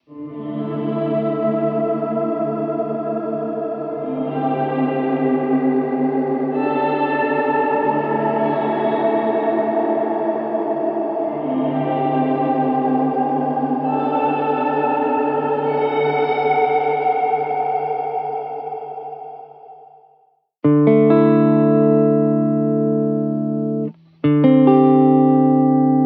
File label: Guitar Wormhole; Wormhole Serpent; H90